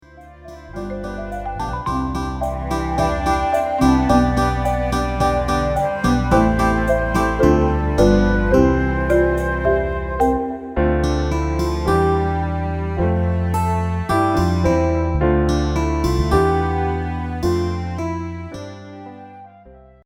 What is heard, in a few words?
Instrumental